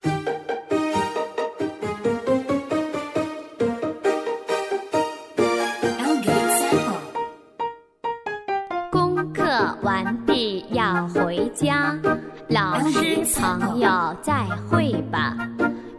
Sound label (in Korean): 중국여 챈트노래